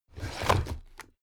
drawer cabinet crammed open heavy halfway

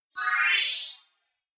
appear sparkle short

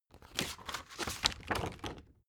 spring binder open long

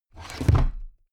drawer cabinet crammed close heavy halfway

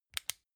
flashlight on fast

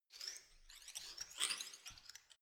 organism short

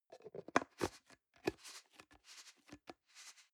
plastic can small lid screw close soft

